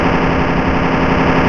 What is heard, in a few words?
bulldozer move; mother2